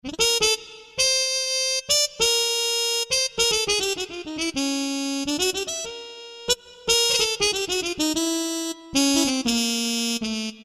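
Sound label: brass Mute, Yamaha TG500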